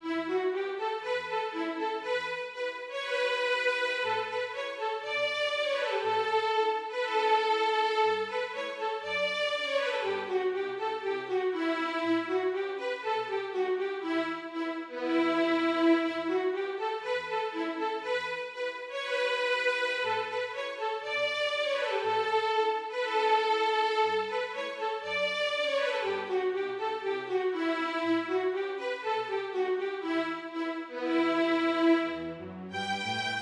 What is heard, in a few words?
Str Vln; Bartok